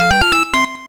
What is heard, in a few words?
samsung text noise